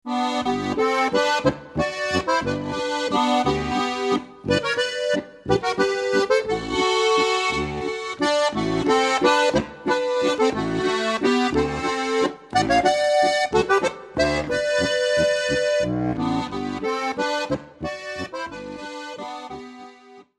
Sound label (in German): walzer